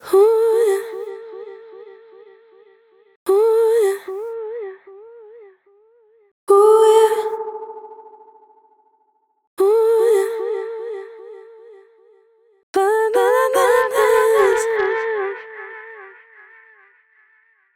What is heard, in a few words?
echoes delay; Delay-Plugins; nomad factory